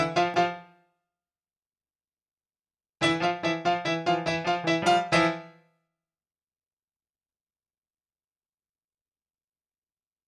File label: KEYS; NAMM 2018; MyMix Recordings NAMM 2018